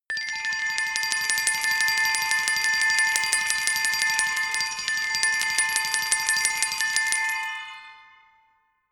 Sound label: glockenspiel mysterious tremolo low octave